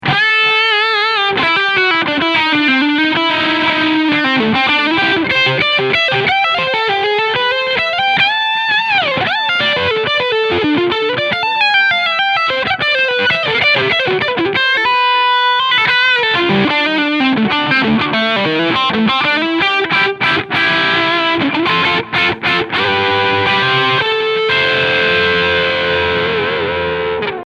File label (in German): Leadsound Steg PU; Chapman Guitars ML3 Pro X